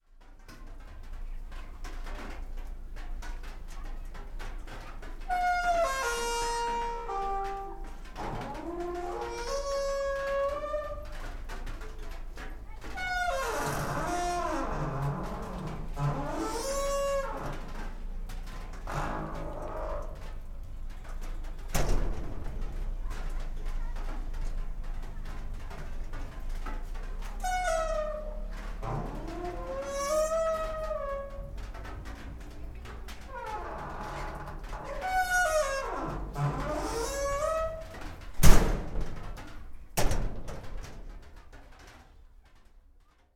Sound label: consumer services door, Sergiyev Posad